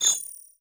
ui switch mode

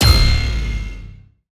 ui char dead

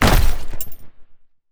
ui package drop

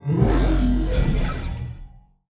message success

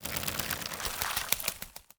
paper dragonfly

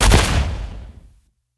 fire dagger

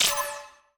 ui revive

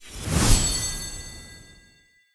target complete

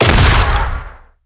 tiger punch

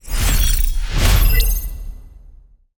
ui start

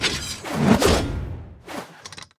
swo skill whoosh

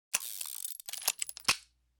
revolver change

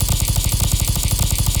dark gun shot

